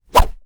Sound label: gore weap golf club whoosh; soundmorph